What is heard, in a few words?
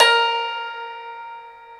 Su Gzheng A3; Multisamples; Digital Sound Factory Planet Earth